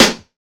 steel snare drum sample a sharp key, snare one shot tuned to the A# note